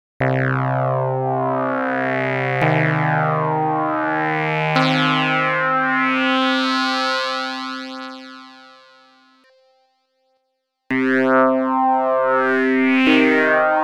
Lin und Log Filter FM

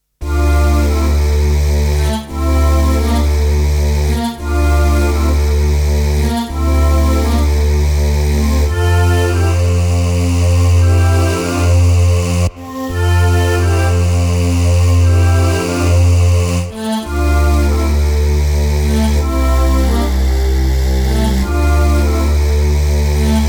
Emax Roland Chor

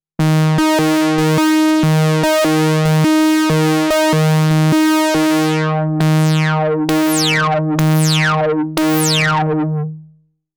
Resonanz FB0 SE80

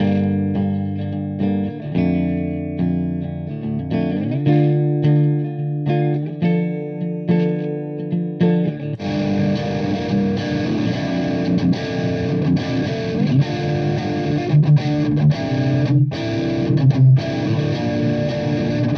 Verzerrer Pedale High Gain; Revv G3

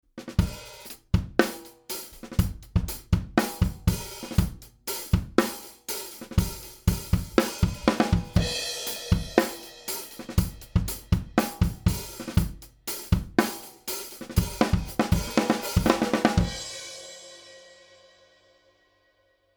AMS Neve 33609N Drum Bus 4 zu 1 Starke Kompression mit Limiter max. Reduktion 7 dB

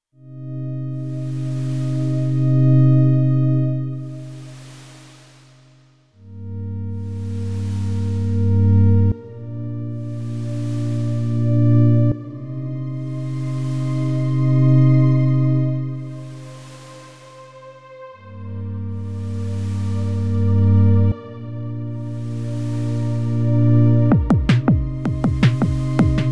M8 Tracker Songbeispiel; Dirtywave

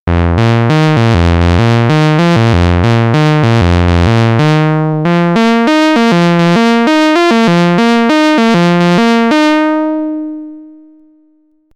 Sägezahn Rechteck

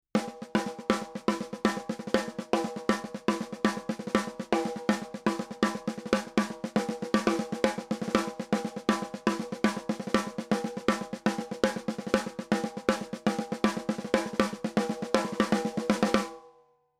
UK Sound 276 Snare 4 zu 1 Attack 8 Uhr Release 4 Uhr max. Reduktion 5 dB

